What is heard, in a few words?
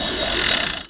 chaingun spindown; weapons; Tribes2